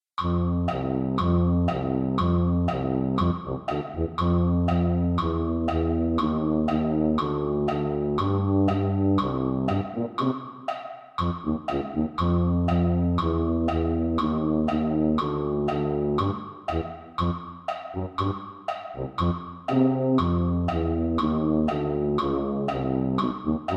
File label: tuba; holiday brass